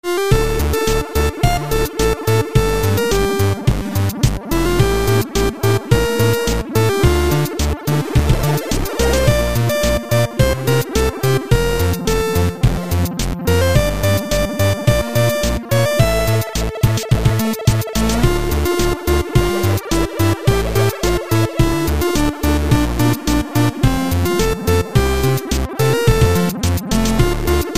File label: c64; Chip